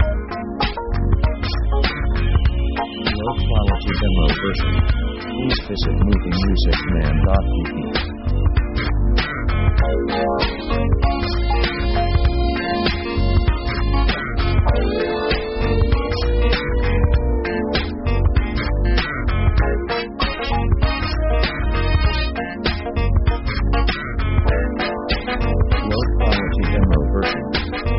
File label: funk; synth themes